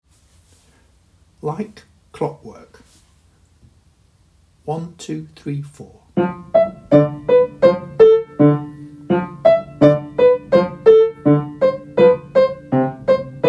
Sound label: Like Clockwork